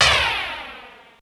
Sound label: flange; JUST FOR FX